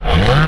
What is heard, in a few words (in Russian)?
udar